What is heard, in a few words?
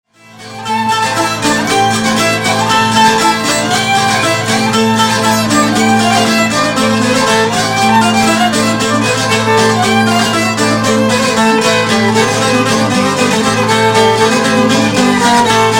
Ikariotikos